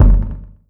small reverb bass drum sound e key; kick drum samples

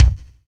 kick one shot b key

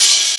studio open hat sample f sharp key

